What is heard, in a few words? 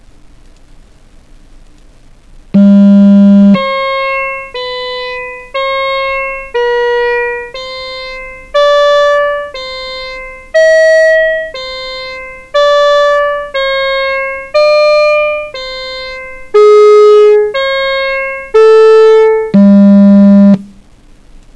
piano